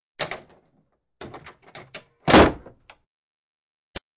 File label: Track, Doors; 헐리웃특수효과음; 문 소리